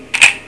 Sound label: leica r4